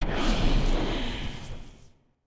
imagination tail, ui

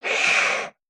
baby spider attack idle; creatures mechanical

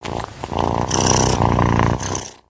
Stretch, creatures organic

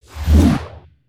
Seagull Swoop; creatures organic

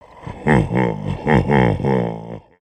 Blue Demon Laugh; destructibles

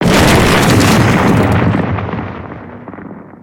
Ship Explode Shooting Gallery; destructibles

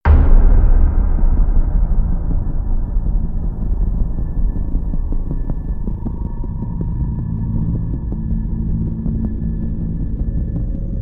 Dark Reveal Music; cinematics

